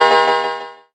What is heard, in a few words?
notifier ring; ZTE Open-C